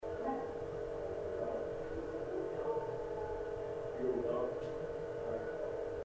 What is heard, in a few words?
Woman Singing office solo